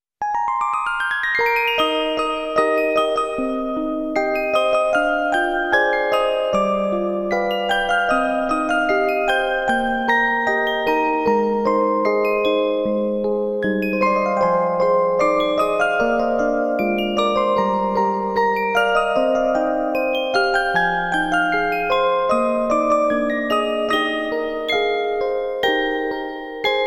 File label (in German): Orgel